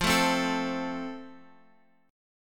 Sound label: F Major, Guitar Open F; strummed